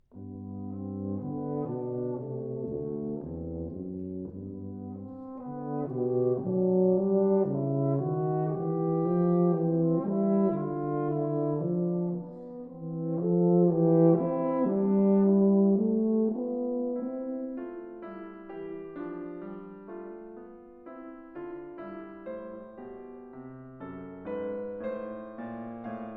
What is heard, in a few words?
Suite for Horn, Tuba and Piano, Berceuse